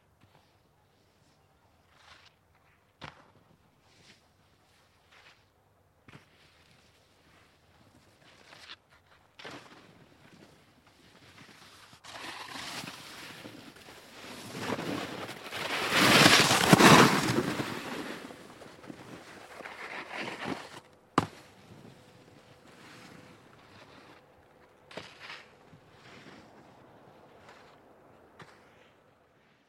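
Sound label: snowboard sounds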